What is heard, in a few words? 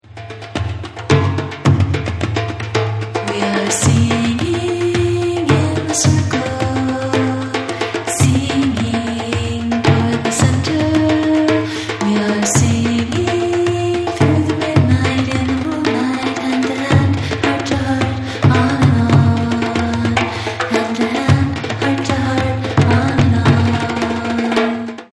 singing in the circle